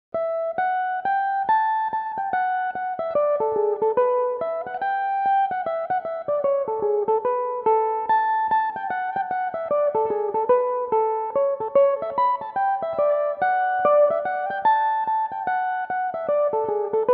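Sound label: D major, mandolin; Henry Savage's Favourite; Belmuse EM-190 electric mandolin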